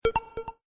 pickup; Legends of the Hidden Temple - Unlock the Past

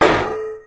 hit metal; Gumball - Go Long!

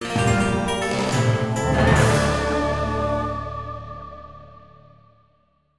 win; Bunnicula - Rescuing Harold

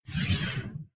portal exit; Henry Danger - Day Of Danger!

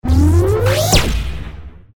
portal warp; Danger Mouse - Ultimate

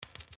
Coin; The Adventures of Puss In Boots - Catch The Thief; NextlevelGames

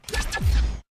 correct; Ben 10 - Alien Catcher

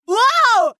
Dodge; Buttercup; The Powerpuff Girls - Mojo Mayhem; NextlevelGames

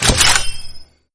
upgrade; Gotham City Speed

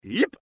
jump; Danger Mouse - Ultimate

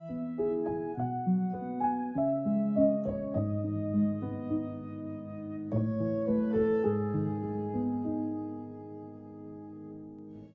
music pause help menu; Dorothy And The Wizard Of Oz - Cookie Magic

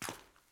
footstep temple; Ben 10 - Omnicode

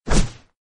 whoosh; Power Rangers vs Teenage Mutant Ninja Turtles - Ultimate Hero Clash 2

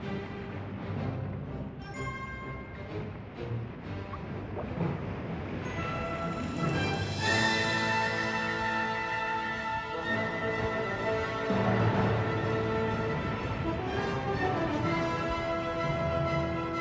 battle Loop; Lux Ahoy

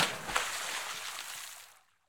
splash; Lux Ahoy

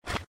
enemies appear; Adventure Time - Break The Worm; ThrillGames

